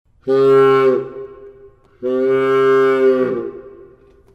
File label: Foghorn